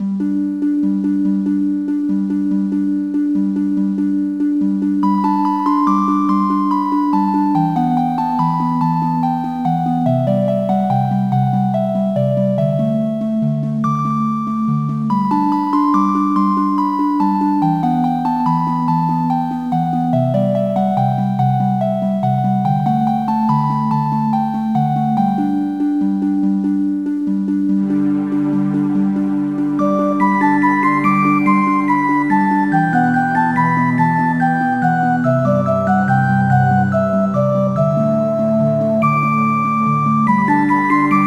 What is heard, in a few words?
waltz